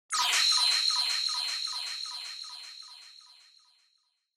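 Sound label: Onoma Syakiin Delay, シャキーン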